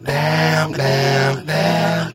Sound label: damn damn damn SUNG